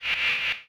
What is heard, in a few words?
Squelch; NAVTEX